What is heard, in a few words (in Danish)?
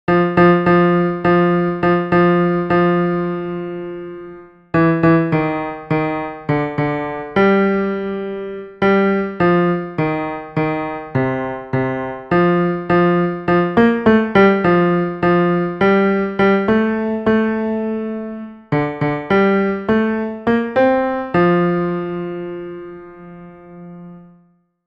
bas